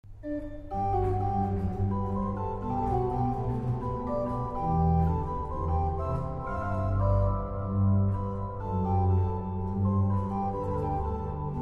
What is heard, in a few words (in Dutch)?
orgel; Psalm 85